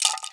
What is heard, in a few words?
RATTLE, INDIAN PERC; Didgeridoo and Other Primitive Instruments